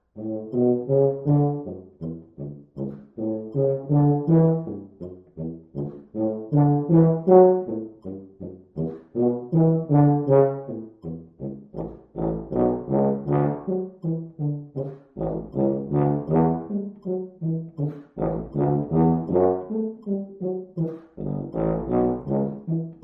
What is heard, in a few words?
Etude 80 BPM